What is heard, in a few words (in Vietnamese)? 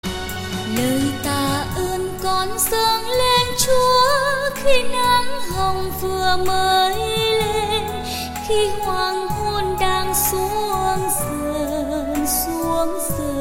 Nhạc Chuông